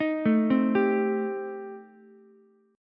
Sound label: A Minor 7th Flat 5th A Ukulele, chord; Am7b5 arpeggiated; 8,9,8,10